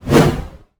Text Message Woosh; SoundsCrate

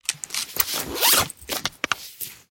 zippers and clicks

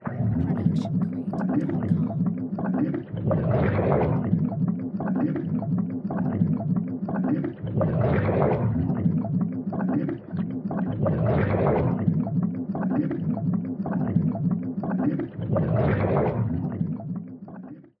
underwater bubbles; soundscrate

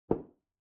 footfall boot step; soundscrate

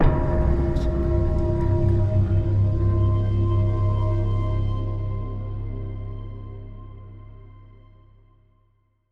Suspenseful Ident; soundscrate

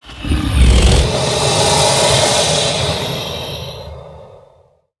crocodile hiss; soundscrate

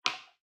footfall heel; soundscrate

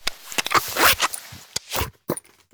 zippers and packing

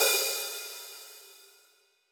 hi hat 14 inch open; soundscrate

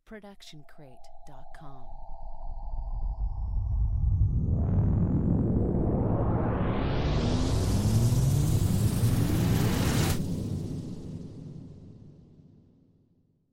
Cinematic Riser

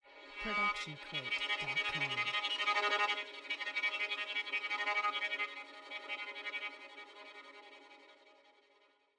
Violin Shivering Tremolo Long

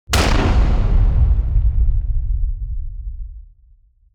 Impact Explosion; soundscrate

